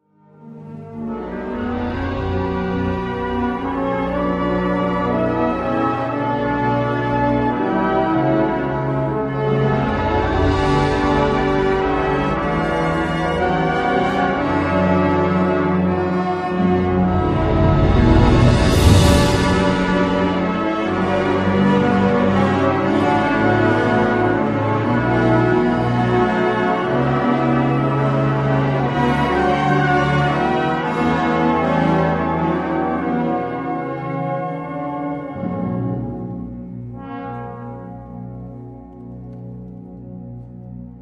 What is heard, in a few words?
lento; aires do norte; ensembles